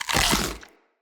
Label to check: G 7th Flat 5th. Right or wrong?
Wrong